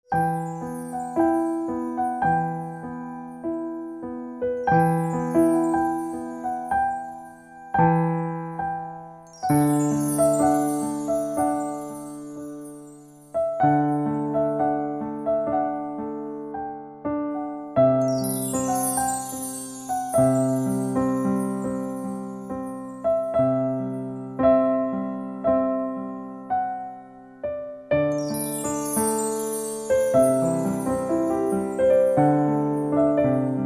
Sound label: Piano Silent